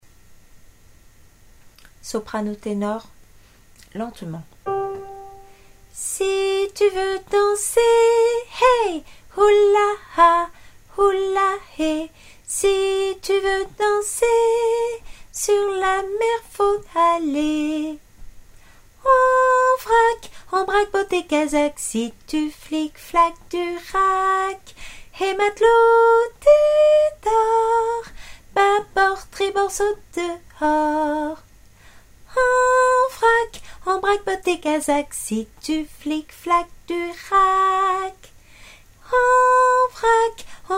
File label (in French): sop ten